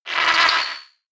notification muffled distortion ring; kommunikation; signale

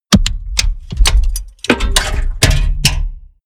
mechanics large movement; industrie-wirtschaft; mechanik

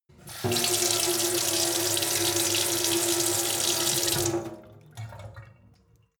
sink water flow medium

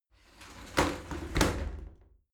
shower slide door close regular

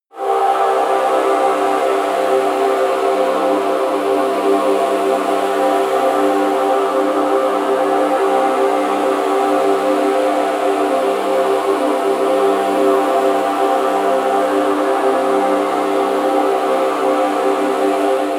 pad sweep choir sizzle; flaechen

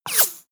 bullet large whoosh miss; krieg-waffen; geschoss

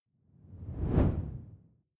whoosh simple low; swooshes-swishes

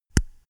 microphone pop off; objekte

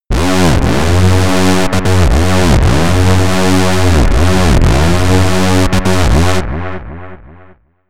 tumble and rise again high; tonal-melodisch

